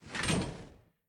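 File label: movement armor metal; Smite; Cabrakan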